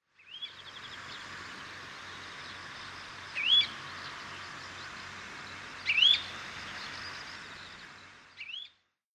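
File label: Pacific Golden Plover; hyogo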